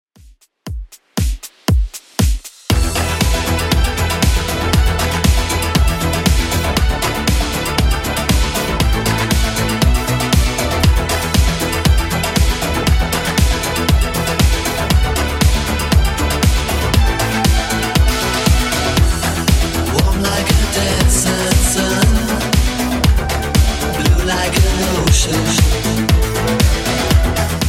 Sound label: 80s Redrum 118bpm